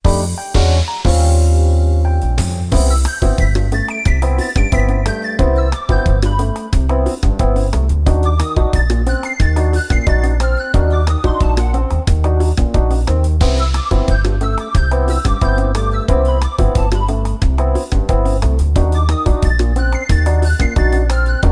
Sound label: crow bgm; Let's Play with Sakura!